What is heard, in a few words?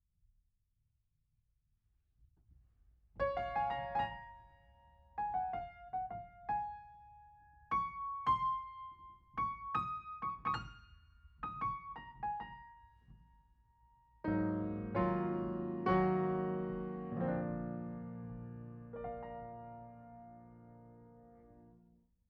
Piano Mono